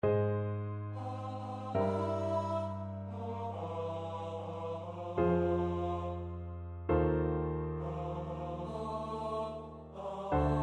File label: tenor voice